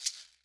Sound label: Acoustic Percussion One Shot